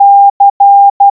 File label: oubun c, morse